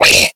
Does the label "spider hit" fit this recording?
Yes